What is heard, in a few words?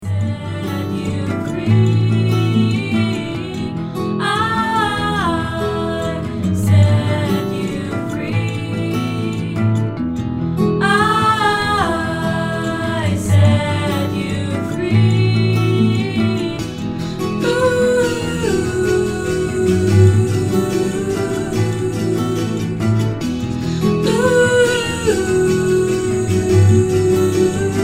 INDIE POP